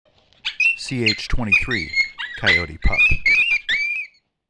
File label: Coyote Pup Distress